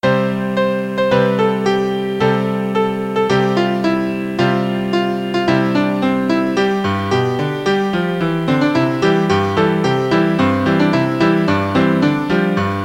klavier

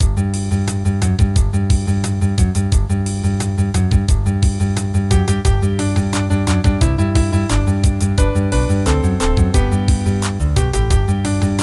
zirkuslieder playback

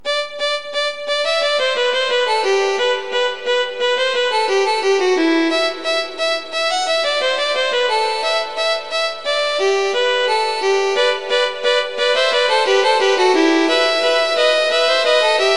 kanon fuer 3 violinen partitur

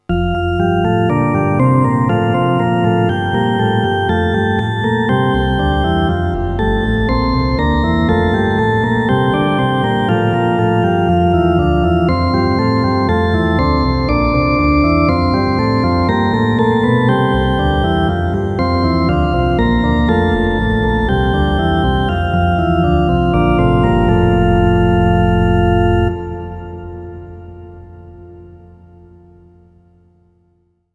nun danket all und bringet ehr, orgel

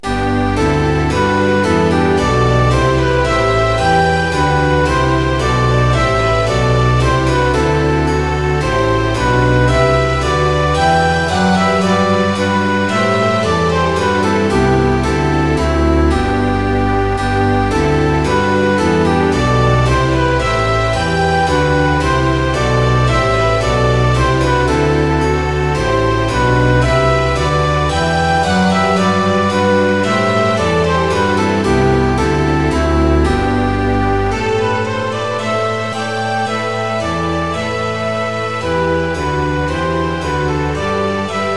orchesterfassung mit floete; intrada

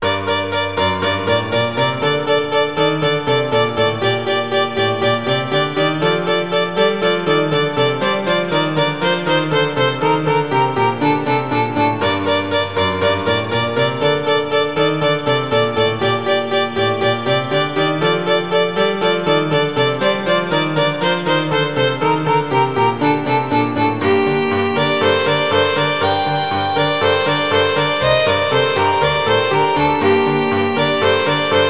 violine u klavier; spielmusik

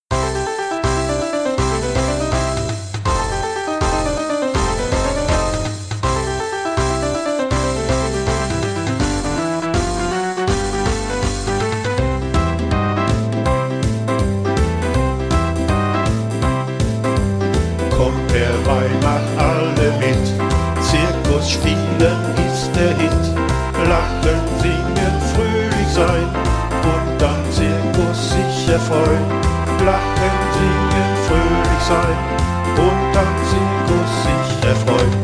zirkuslieder zirkus boogie

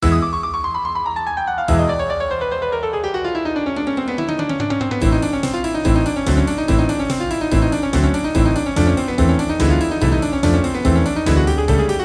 zirkusmusik hummelflug

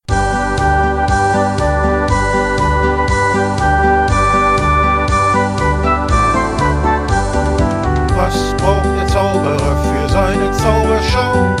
zirkuslieder

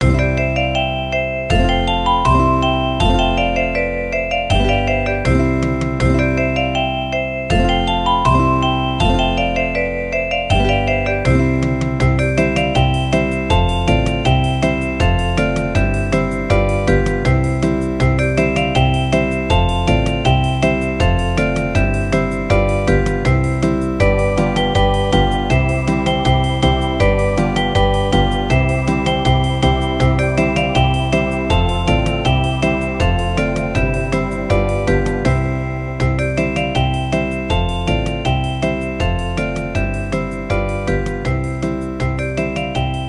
kinderlieder playback

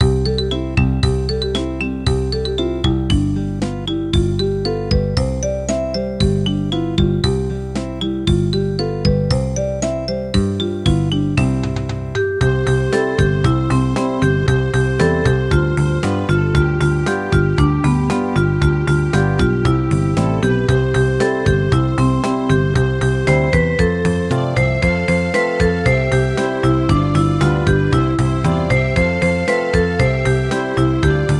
kinderlieder playback

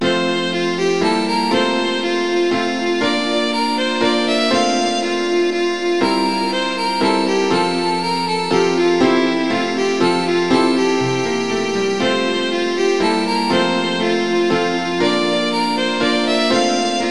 menuett f dur; bach

